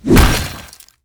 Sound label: metal hammer hit